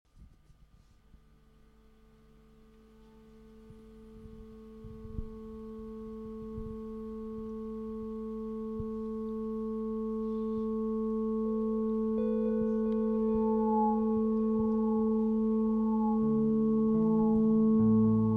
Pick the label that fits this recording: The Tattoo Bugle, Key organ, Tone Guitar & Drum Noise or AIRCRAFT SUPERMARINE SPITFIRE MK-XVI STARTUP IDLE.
Tone Guitar & Drum Noise